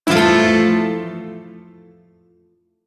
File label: Shawzin Maj Chord; Warframe; Duviri Shawzin